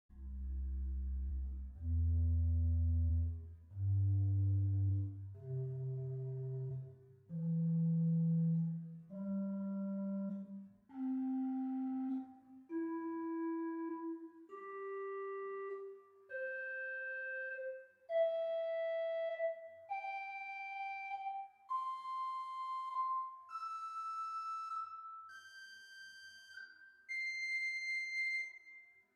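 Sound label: Great Lieblich Gedeckt 8 arpeggio, organ stops; St Annes Moseley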